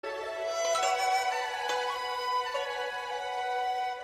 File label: Sacred flute Short